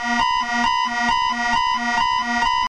Alarma

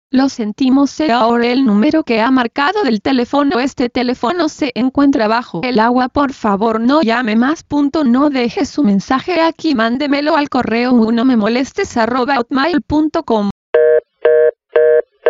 Telefono Bajo Agua

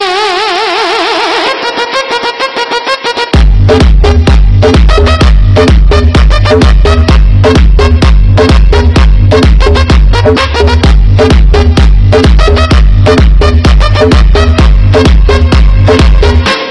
guaracha

